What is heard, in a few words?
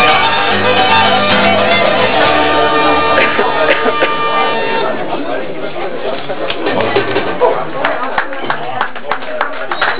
Irish Band; Irish pub